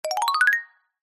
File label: game sound mallet climb ascend